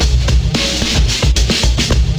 drumloop

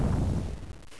firework

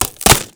tree harvest

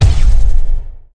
ionic pulse

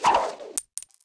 coin flip

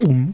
eat

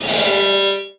airy

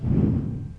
flame go

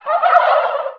gobble

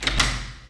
gate open

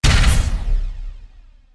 use lock

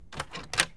door open

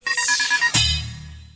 keypad hit

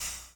lil hiss

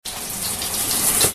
shower

